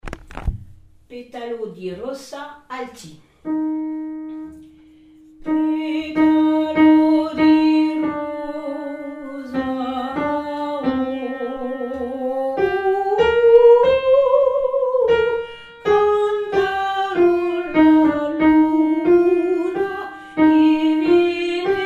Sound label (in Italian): petalo di rosa alto; bepi de marzi